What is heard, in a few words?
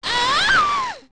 Gerudo Die